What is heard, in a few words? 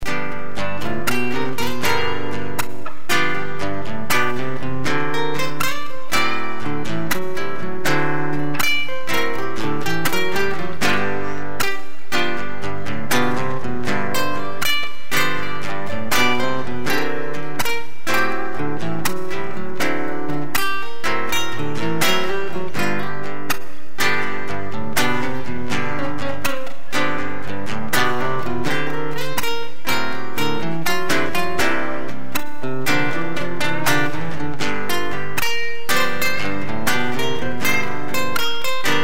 blue country nylon